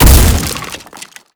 Skeleton Explode

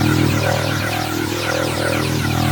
Jetpack Loop; City Item Jetpack Mk2-2

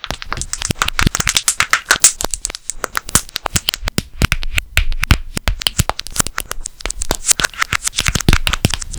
Doll move

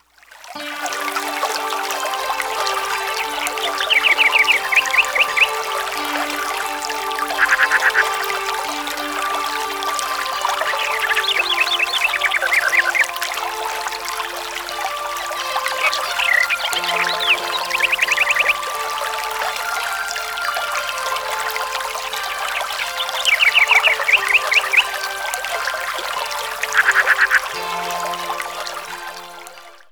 Street Mode Ambience; genesis; espn nba hangtime 95

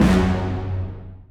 Combo Losing Hit; Bee Movie Game; Duel

